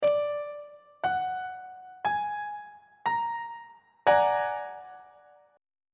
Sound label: b flat augmented 7th 1st inversion on treble clef